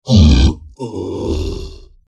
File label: die, troll